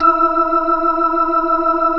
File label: E4, PERCORG; Sonic Reality; Vintage Timetraveler